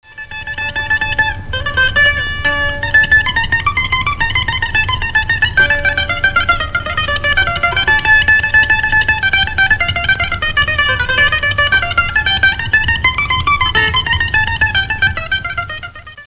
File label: baglama